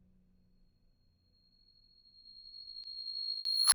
thai ching rev; Thailand

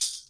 india hatheli

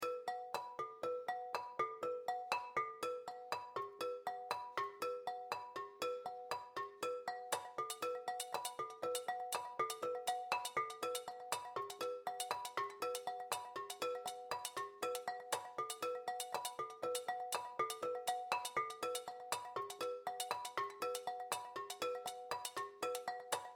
flowerpotophone

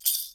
india hatheli soft

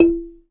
marimba E4, Ghana Marimba

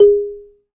marimba G4, Ghana Marimba

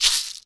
box rattle; Kenya

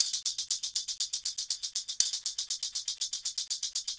india hatheli loop 120

